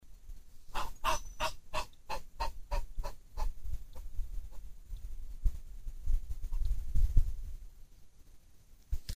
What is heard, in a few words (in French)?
onore du mexique, chant